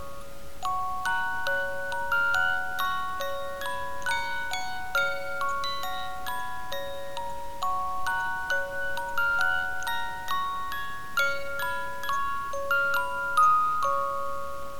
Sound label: Hark the Herald Angels Sing, Music Box